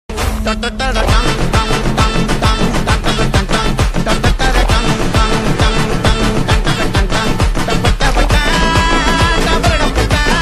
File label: telugu song ringtone; kalki; prabhas